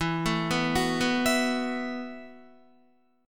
E Suspended 2nd Suspended 4th E, Guitar, 4F,4A,5C,5G,5C,6E; arpeggiated; JGuitar